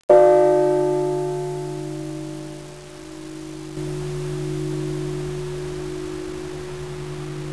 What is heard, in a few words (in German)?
zellerfeld bronze, Glocke Bronze; Zellerfeld, St. Salvatoris; Marienkirche